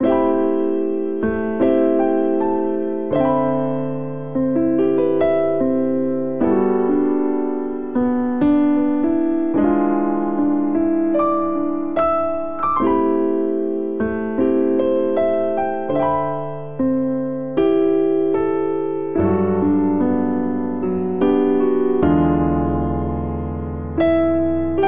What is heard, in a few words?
Progression Ballad Pop ii - V - I - VI7 G Major 75 bpm, Grand Piano; MIDI Clips; Ableton; Factory Packs